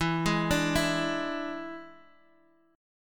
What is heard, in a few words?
E 7th E down Guitar Half Step Down